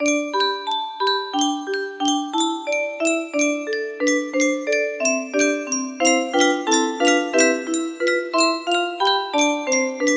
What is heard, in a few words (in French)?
boite a musique